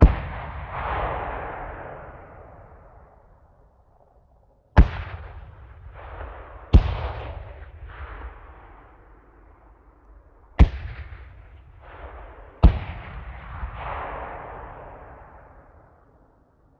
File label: ambience far boom